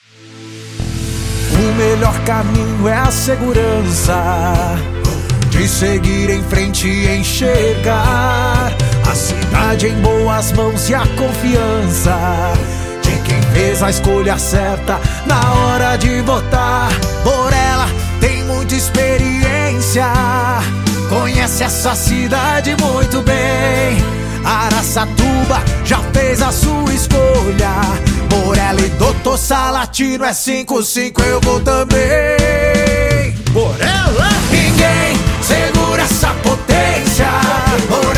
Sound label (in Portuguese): jingle politico campanha eleitoral; borella aracatuba